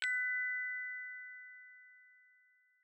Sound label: Hourly Chime Haptic; i OS; iOS10; nano